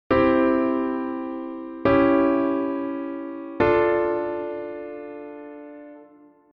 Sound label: Passing Diminished